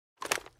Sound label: aa12 put away; EMXArms; mg90